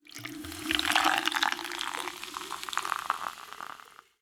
Dynamic Soda Cup Dynamic Aquatics

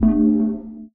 Hover Menu

